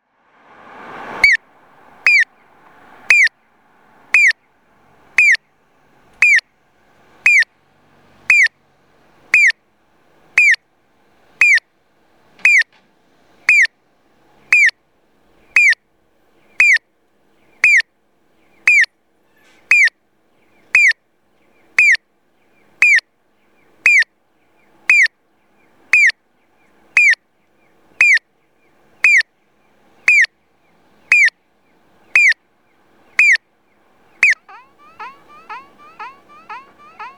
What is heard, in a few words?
piyo, onkyo kosaten; aichi; moriyama; hyotanyama; SE; nagoya U-TP-1